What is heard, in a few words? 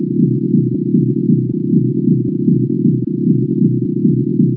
Alien Heartbeat